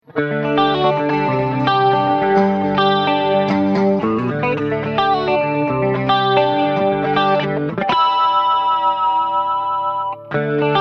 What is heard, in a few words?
Clean